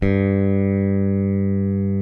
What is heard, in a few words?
SB G1 SOFT, SPECTOR BASS; Northstar - New Gold VOL-1